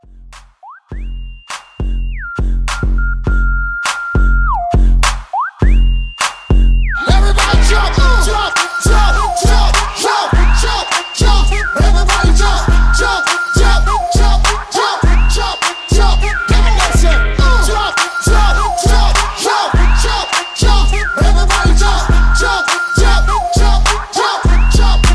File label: Blend Hype Re Drum 102